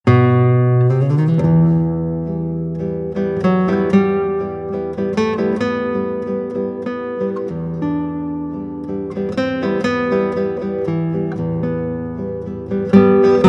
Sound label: prelude